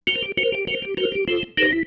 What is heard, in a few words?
Pattern, Organ